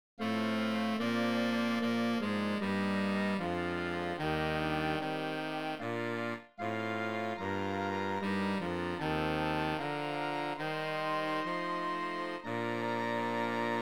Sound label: tuba Eb; gudsignevaartdyrefedreland